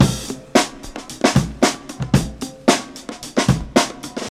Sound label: 112 bpm drum loop sample C# key, Free drum loop sample - kick tuned to the C# note